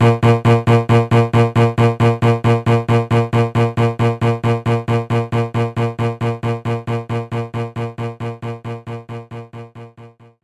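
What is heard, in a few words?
Cello Attack A#1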